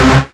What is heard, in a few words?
Synths C; vengeance essential club sounds-3